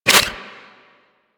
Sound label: Roulette Projectile Jam; Warframe; Cowgirl